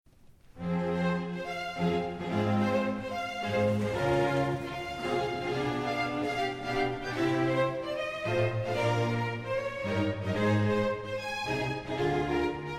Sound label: concert choir chorus; surely hath borne our griefs